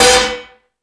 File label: Crash, Drum Machines E-MU Pro-Cussion